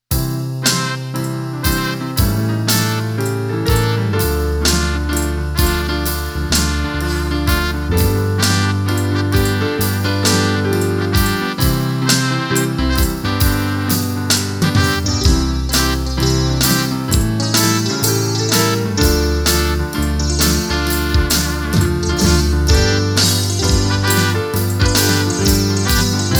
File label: inst